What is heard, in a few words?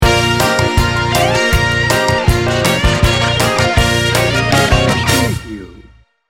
retro memphis blues rock sting bumper